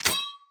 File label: loadout switch